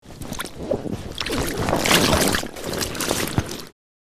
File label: Lilo inflatable mattress person movement in water